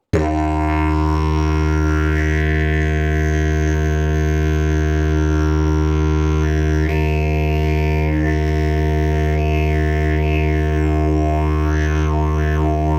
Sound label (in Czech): javor, didgeridoo; javor zlutak